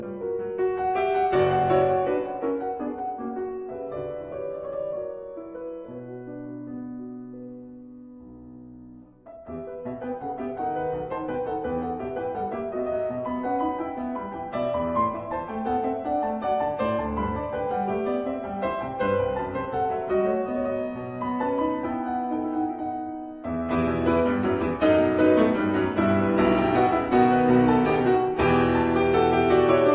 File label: Courante in E minor; Lully